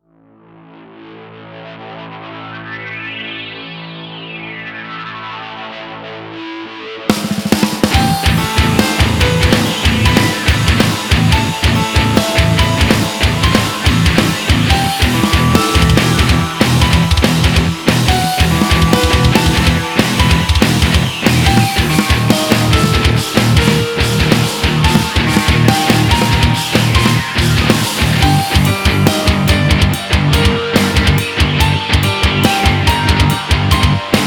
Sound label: hard piano